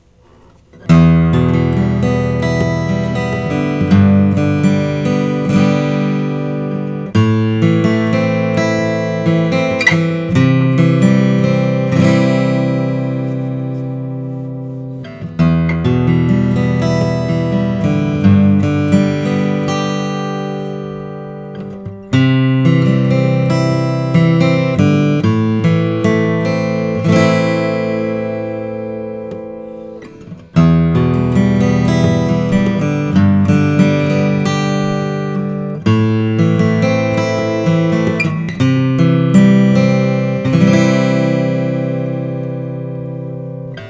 martin custom 00 12 fret slothead

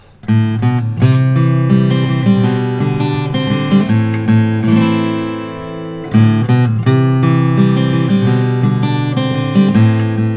martin custom 00 21 special natural

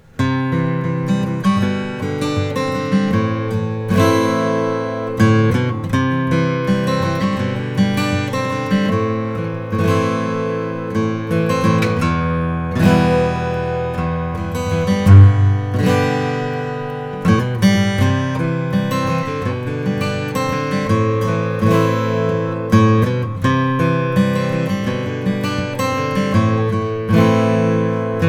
santa cruz om grand with german natural

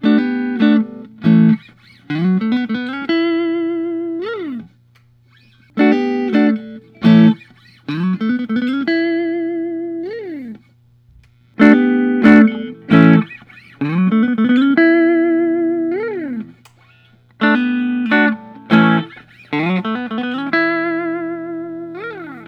Fender Custom Shop; greg fessler

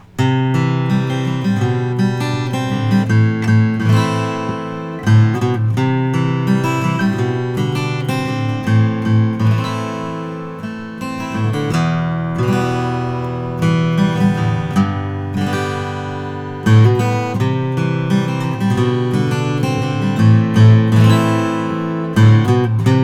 martin om 21 special

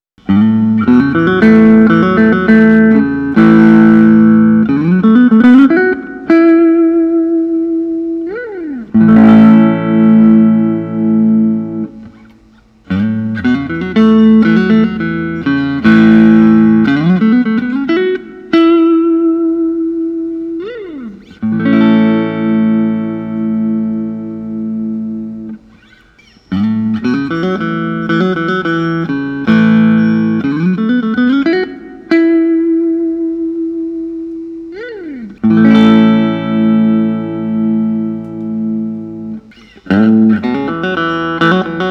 fender custom shop tuff; merle haggard

